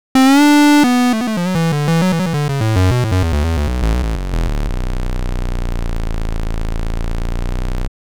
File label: square; fabfilter twin2